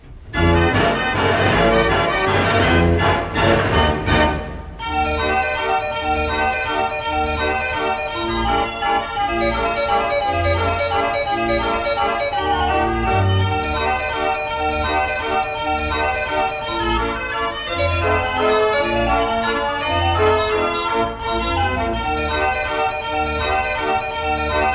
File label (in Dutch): carillon, draaiorgel